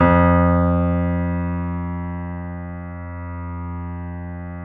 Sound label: BOSENDORF; E-MU Producer Series Vol. 2 – More Studio Essentials; Keyboards; Bosendorfer